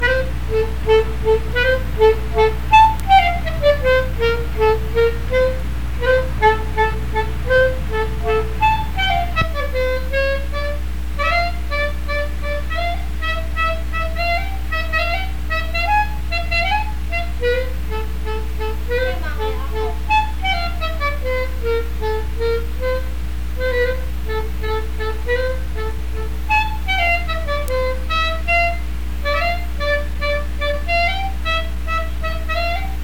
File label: clarinet solo